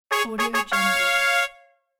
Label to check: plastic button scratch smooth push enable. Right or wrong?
Wrong